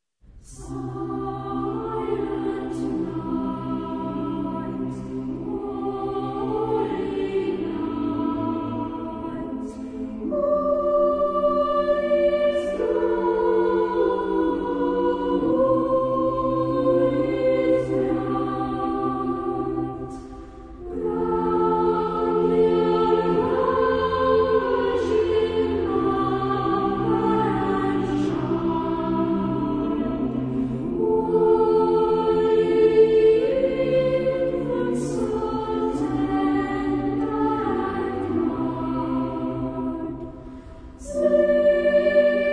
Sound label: Cathedral Choir